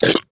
mud

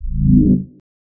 Allert Dub; FREE SFX PACK VOL 2

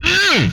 hit, vocal; MMRetroArcadeSoundsPack

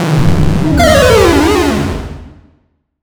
Retro Negative Long

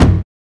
Shot Blunt; FREE SFX PACK VOL 2

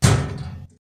metal

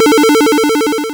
Retro Ring

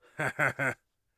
laugh, Warrior Voice; Warrior Voice Pack

